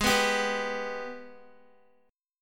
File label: Ab Minor Major 7th, down, Guitar; strummed; AbmM7